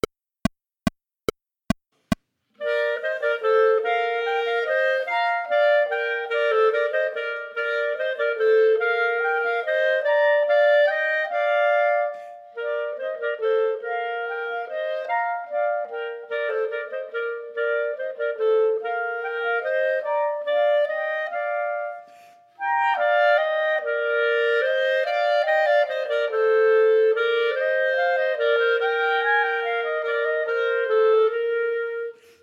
Minuet, Chamber Music for 3 Clarinets, minus 3rd part; Minuet II; Partita in F; Chamber Music for 3 Clarinets Vol I